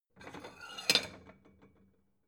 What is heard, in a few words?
plate small take slide soft